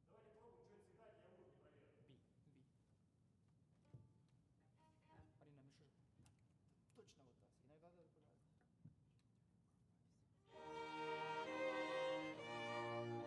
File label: Overhead